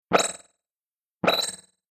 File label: Future Telemetry Two Glassy Robot Computer Beeps Interface Delayed Space; Sound Design; Communication Sound Effects